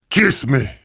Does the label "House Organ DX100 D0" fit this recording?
No